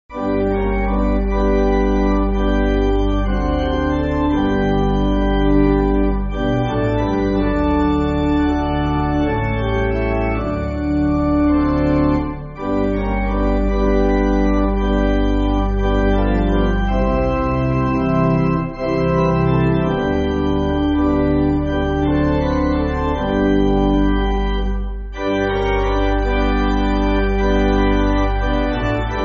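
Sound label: Pipe Organ, Backing Files for Churches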